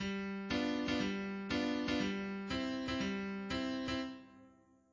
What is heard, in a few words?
march; orpheus